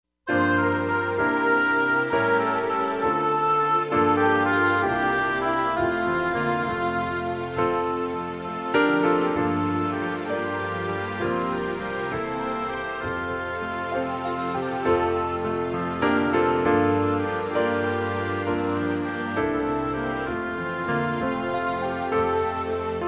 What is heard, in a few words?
Wonderful Words of Life Accompaniment; the beatitudes preschool spring